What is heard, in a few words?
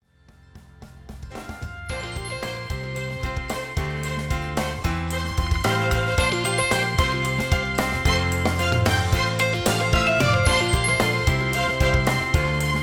Media volume, phonetones; LG V60 ThinQ